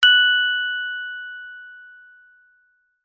multimedia notification bell chime ping tone high pitched